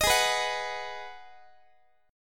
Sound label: Ab Minor 7th Ab, Guitar; strummed; JGuitar